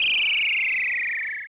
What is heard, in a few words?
Laser Shoot